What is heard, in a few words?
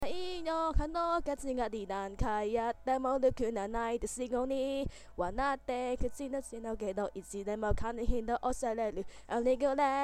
fail